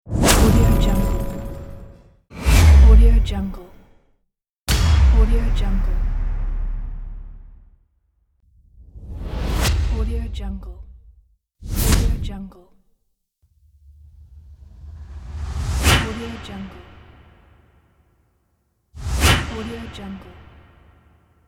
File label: swooshing